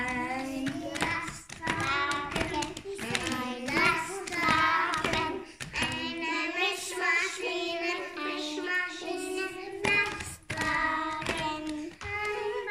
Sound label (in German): Lastwagen, Mischmaschine & Baukran